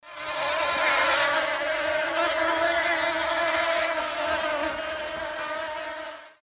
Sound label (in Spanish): abejas